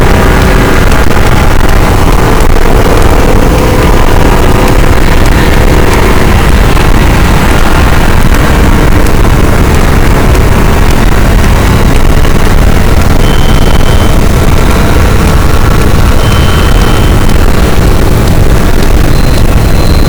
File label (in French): cachalot positif, boat, Physeter Macrocephalus